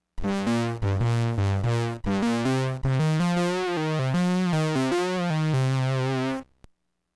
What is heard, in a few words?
moog lp three